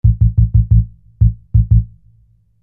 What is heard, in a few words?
Sine Bass; Construction Kit; HIP-HOP; Sonic Foundry (Sony Creative Software)